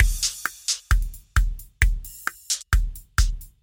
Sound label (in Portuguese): RITMO